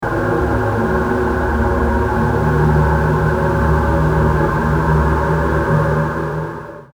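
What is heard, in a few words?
174 atmos, Dark and looming; Tech Flavours; Drum & Bass Sample Pack inspired by the sub-genre of Tech Step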